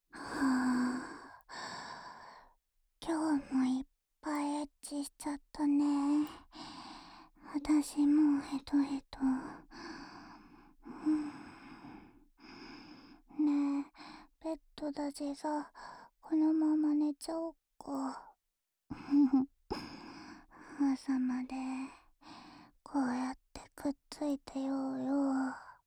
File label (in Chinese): 安眠用陪睡（右耳）, ASMR